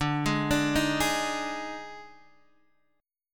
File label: D sharp Major 7th Suspended 4th, Guitar